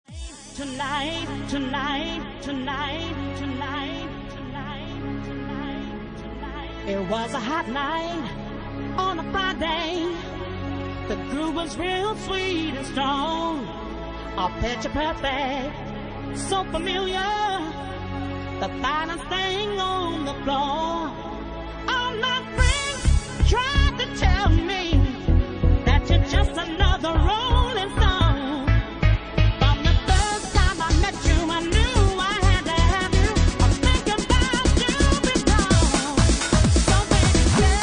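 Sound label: Organ Mix, Bassline House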